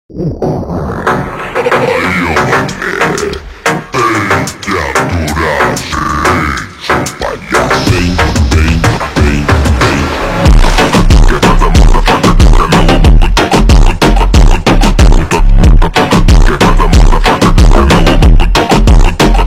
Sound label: slowed reverb